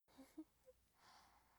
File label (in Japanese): 笑い声; ループボイス